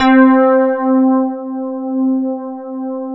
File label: T3 E-PIANO; KEYS; Alpine Volksmusik